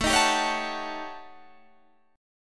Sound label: Eb 7th Sharp 9th Flat 5th, A